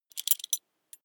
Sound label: metal belt buckle clatter heavy